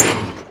hit, blaze; mob; minecraft

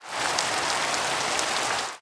rain, weather; ambient; Minecraft

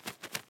parrot fly; mob; minecraft

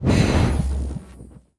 cast, evocation illager; mob; minecraft

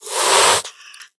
hiss, cat; mob; minecraft

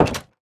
close, wooden door; minecraft; block

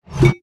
fill dragon breath, bottle; Minecraft

